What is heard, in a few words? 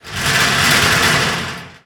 Metal Sheet Move